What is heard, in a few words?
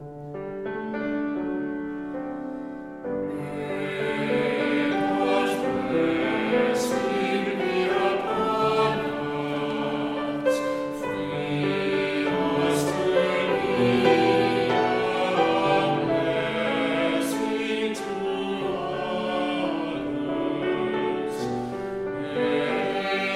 A New Blessing Unison